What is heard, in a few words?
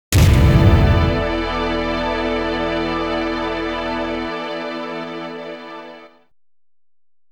Gameover; the king of fighters 2003; ps2